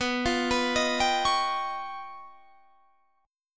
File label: Db 9th Flat 5th B